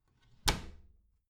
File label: bathroom cabinet door creak close heavy